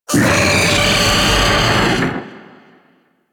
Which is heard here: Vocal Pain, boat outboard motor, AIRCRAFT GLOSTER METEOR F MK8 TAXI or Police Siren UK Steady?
Vocal Pain